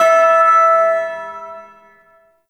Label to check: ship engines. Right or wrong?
Wrong